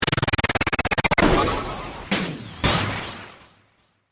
Stinger Chopper, Production, Element, Stinger, Chopper, Hits; Stingers; BlastWave